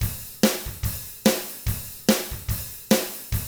ROCK, Double Platinum Drums

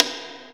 STICK; Rarefaction; Poke in The Ear With A Sharp Stick

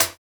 Closed Hat, JUNGLE BREAKS; ORIGINAL JUNGLE BREAKS; JBK DRUM SOUNDS; JBK HATS

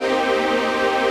CHRD PAD; Best Service; XX Large Pads; Sample CDs

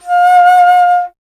Alto Flute

